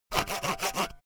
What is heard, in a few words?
sawing small